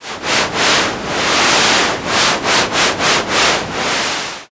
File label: river